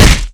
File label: fists hit; Garrys Mod